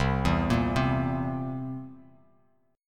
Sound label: C 7th C down, C7; arpeggiated; JGuitar